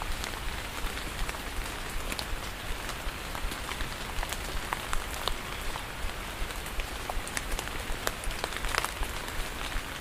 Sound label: rain loop